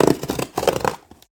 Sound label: box saw; sonniss gdc